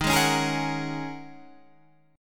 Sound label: G sharp 7th Suspended 2nd Suspended 4th